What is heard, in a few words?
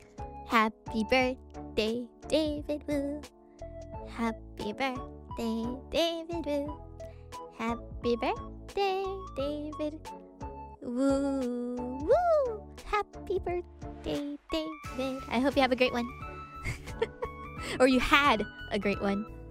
Singing; IRySinging